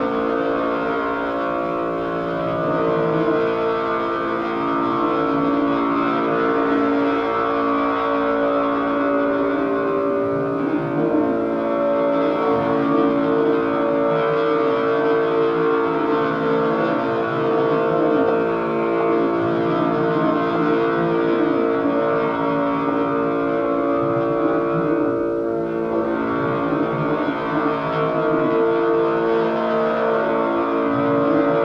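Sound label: Ambience Drone Loop; underworld ascendant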